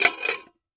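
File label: Pot Lid Metal Closing, cooking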